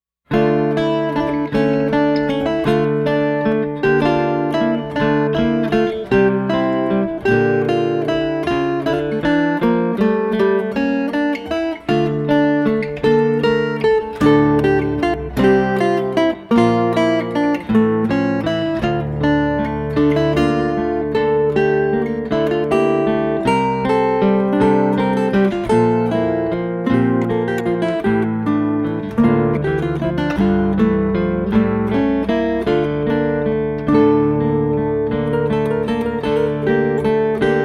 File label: Hindu Melody